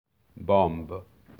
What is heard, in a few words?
bomb